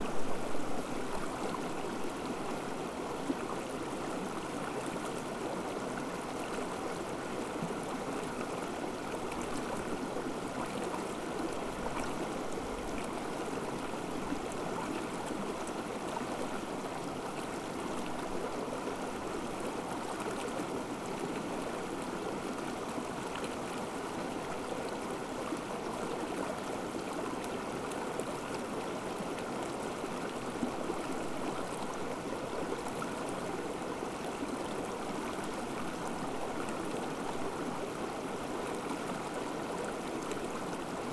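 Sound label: mountain water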